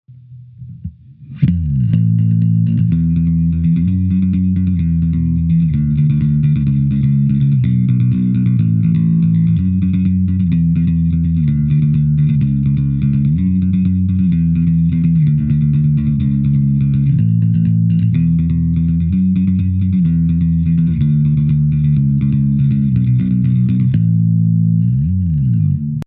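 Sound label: rock bass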